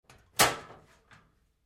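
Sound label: Door Locker Open Close Up Light, Metal Door; Metal Doors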